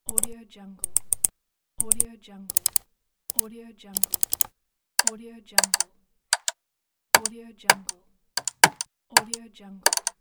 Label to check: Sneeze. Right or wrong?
Wrong